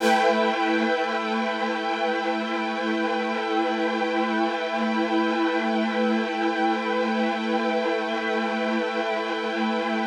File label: Poly String A7:9, synth orchestra